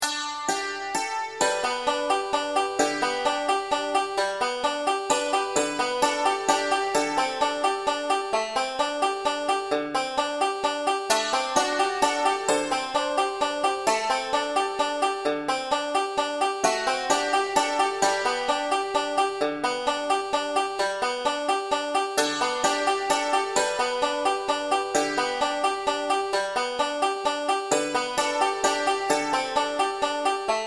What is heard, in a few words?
banjo; beginning 5 string; down in valley